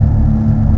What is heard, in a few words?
engine; vladimir